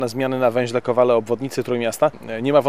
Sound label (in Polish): kowale